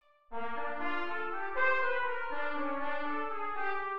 Min7 en C

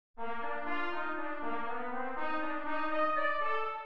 Min7 en C

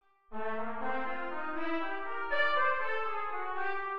Dominante en C

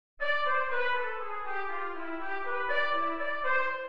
Maj7 en C

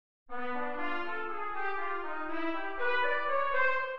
Maj7 en C

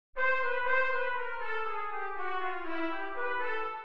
Maj7 en C; La Victoria

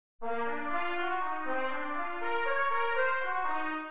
MINb5 en C

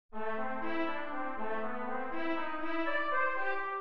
Maj7 inv0 en C; Carnavalito

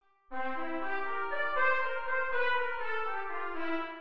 Dominante inv0 en C; Boca de Lopez

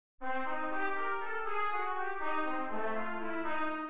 Min7 en C

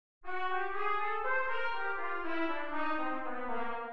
Min7 en C

